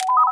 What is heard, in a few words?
Item Selected Positive Beep